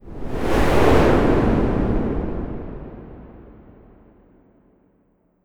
blizzard

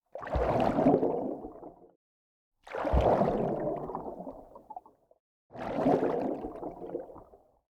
swim in water

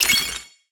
ui get score

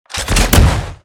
shoulder cannon

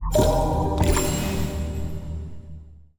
ui dice great

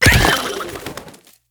skill duck die

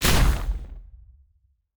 spear fire

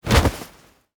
cloth movement heavy

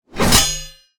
metallic click

